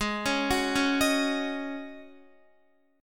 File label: G sharp 7th Suspended 4th G sharp, Guitar; G#7sus4; arpeggiated